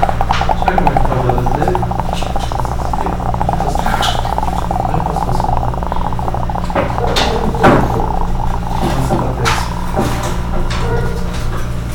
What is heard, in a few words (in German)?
Glas in Der Spuele; O-Ton; Brasil